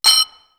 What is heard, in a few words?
TIBET CROTALE; East West; Global Sounds